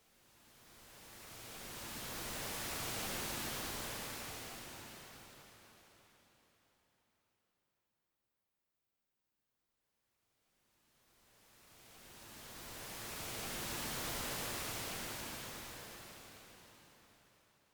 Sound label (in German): rauschen variabel